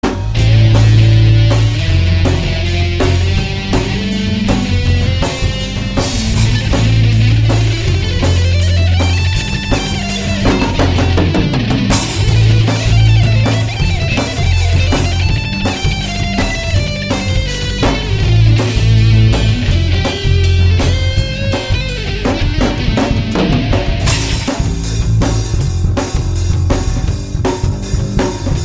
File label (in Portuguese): solo de aguas profundas